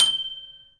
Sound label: Tank full; In the Line of Duty Firefighter